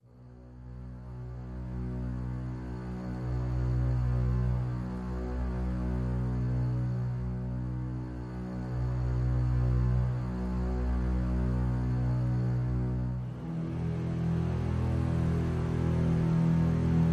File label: Reduced Strings